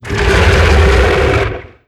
beast vocal grumble

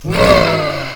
zombie walker kid

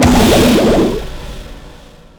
blood laser short

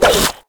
whip

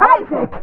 mom muffle

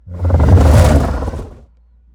charge whoosh; finalmother

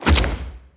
drop poop

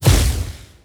beast lava ball rise

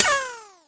battery discharge

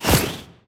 warp; unborn

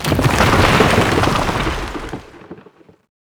ground tremor

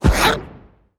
level up; bumbo

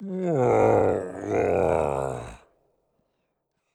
getting dizzy; bumbino

